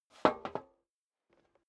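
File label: step dress boots metal dry scuff, footsteps; schritte; menschen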